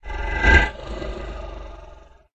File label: attack voice bottom; murgul